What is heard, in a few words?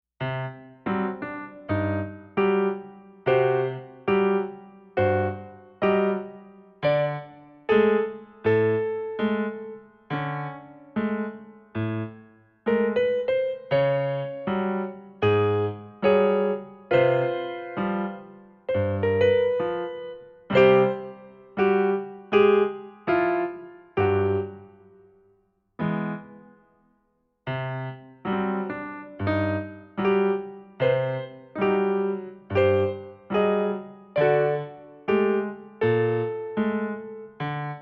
Clumsy Piano Performance; Yokai Watch; Cupistol's Clumsy Piano Performance